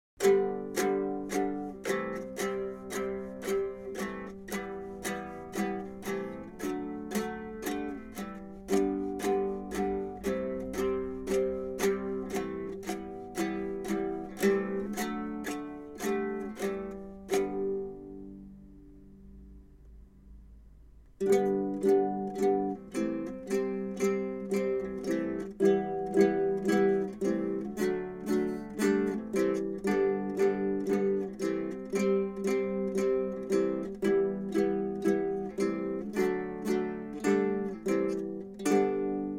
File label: ukulele strummed chords